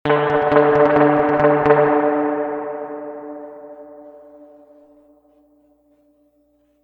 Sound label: reverb tank 3-spring short staccato